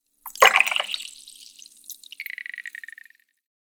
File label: Pour the cocktail into a cup; alcoholic drink